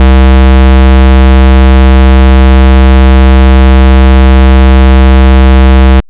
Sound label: Roland SH-101 Pulse